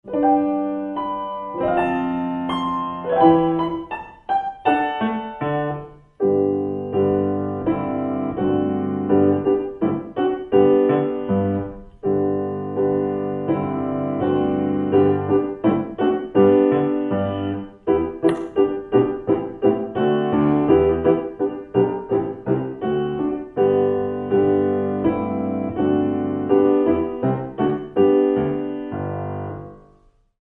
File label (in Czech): doprovod; koledy